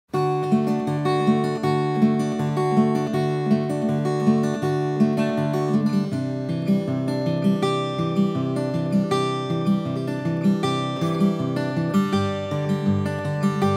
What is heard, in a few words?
Martin 00-18 Authentic 1931; small-body, slope-shouldered